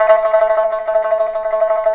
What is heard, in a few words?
PIPA; INIS - Opium